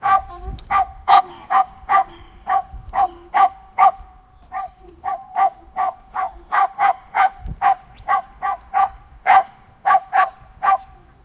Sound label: an eagle and a dog, conflict